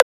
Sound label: Transient; FLPRO